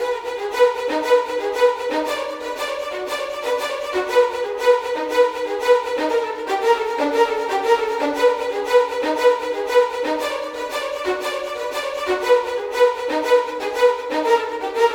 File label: viola; виола